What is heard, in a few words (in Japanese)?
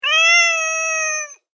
ネコ; 自然・動物